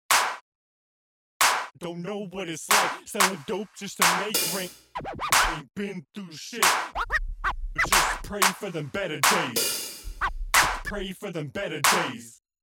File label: Glockenspiel US Hip Hop Mix